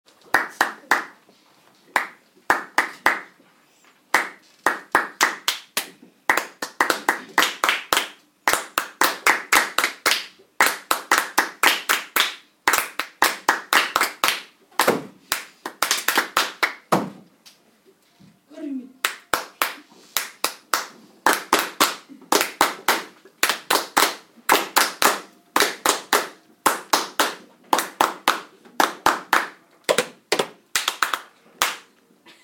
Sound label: polished rhythms